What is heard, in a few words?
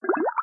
bubbles single